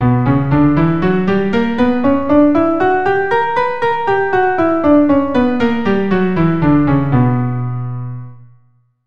B m Harmonic, keyboard

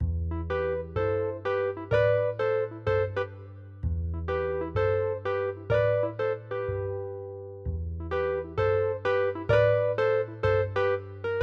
pent

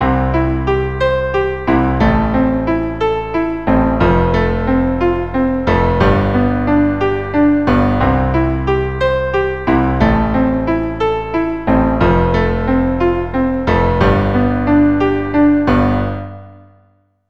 Singer Accomp, keyboard

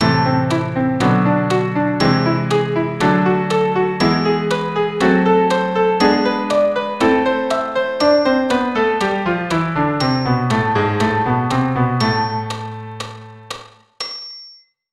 Am melodic, keyboard

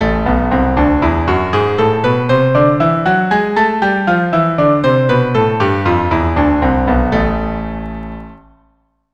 A Melodic minor 2 Octaves apart, keyboard

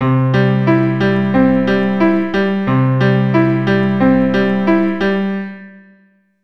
C Arp pattern, keyboard

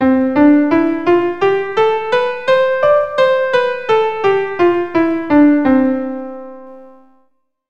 Scale, keyboard

